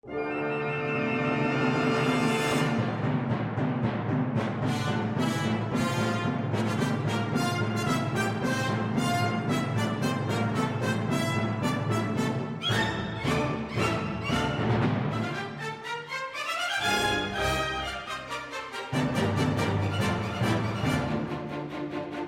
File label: Timpani Drum